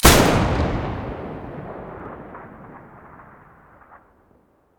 muzzle protecta stereo, weapons

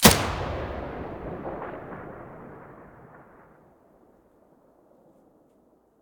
muzzle mono

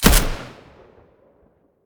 ash12 silencer mono, weapons; STALKER; IWP

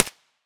mp5 silencer mono distant

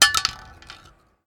Shell 12ga metal; STALKER; IWP

